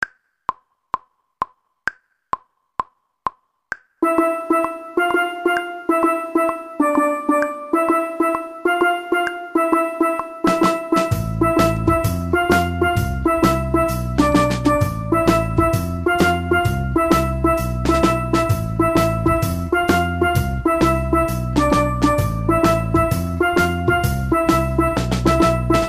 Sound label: steel pans; steel pans 1